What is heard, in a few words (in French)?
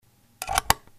jack 6,35 dans une big muff